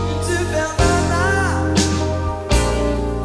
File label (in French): jingle